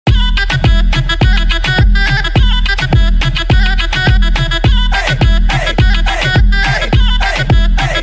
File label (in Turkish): telefon zil sesleri